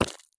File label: sprint gravel; Escape from Tarkov